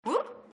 failsafe whoop

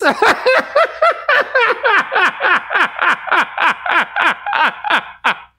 hahahahaharg

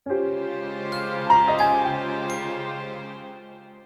game over; botw

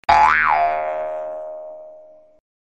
jumping

